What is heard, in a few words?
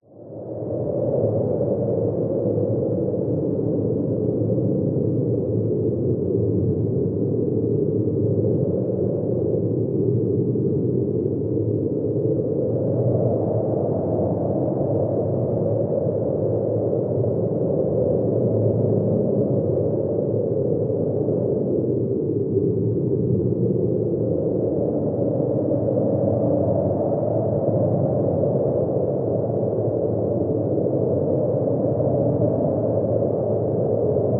nature wind constant strong howling storm